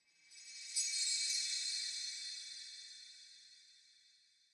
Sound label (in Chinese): 绯红森林微光声; ambient